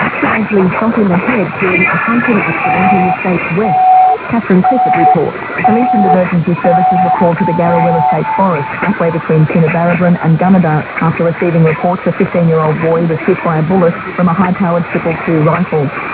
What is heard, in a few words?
828 aus, AM; Grayland Beach State Park, WA; Perseus & various antennas